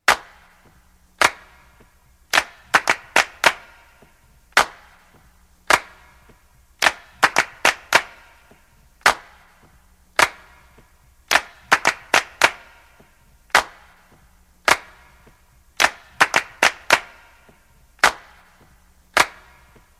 Car Wash

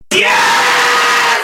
Scream

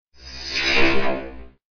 Future SMS

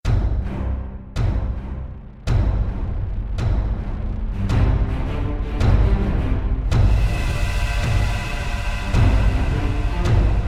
Pop

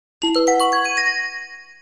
Game Over; Wave Race

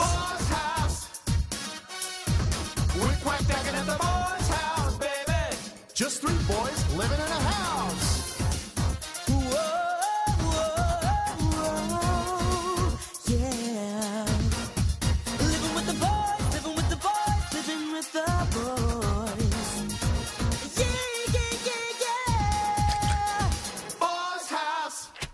house

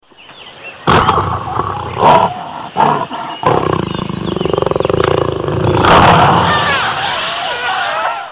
jaguar, animals

